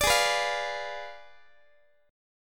Ab Diminished 7th Ab Guitar 4A,4E,5A,5D,5G sharp,6C sharp; strummed; x,x,17,18,15,16; down